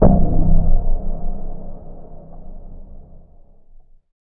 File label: DARK THUD, Impacts, Hits & Booms; Distored Reality